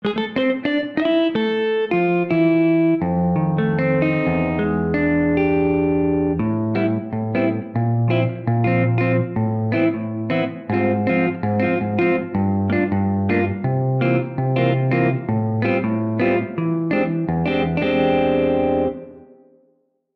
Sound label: Jazz Guitar - Spatial Plate; OldSkoolVerb